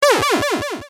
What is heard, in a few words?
Enemy Angry